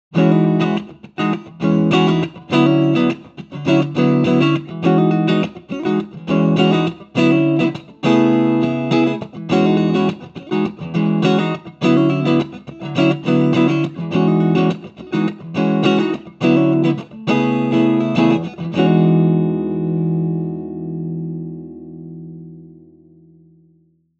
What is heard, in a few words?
Crush 35RT Clean Slight Reverb